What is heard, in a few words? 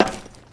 metal footsteps; player